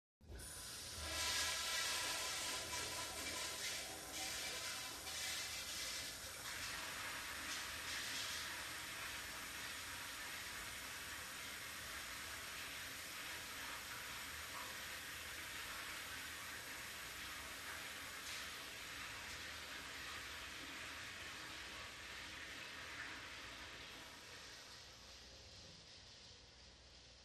Toilet - Public Restroom, Long Distance, Reverb. Flush